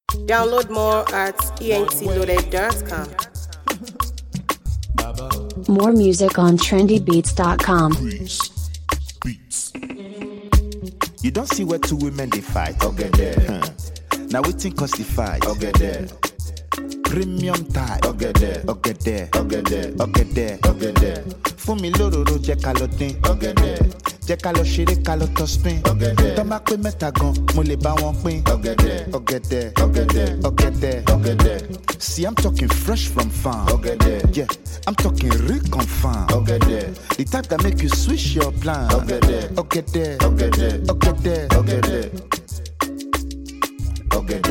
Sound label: Instrumental